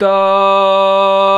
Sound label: VOX, Male Scats